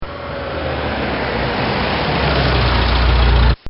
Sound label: Car Pulling In And Shutting Off; Vehicles; Cars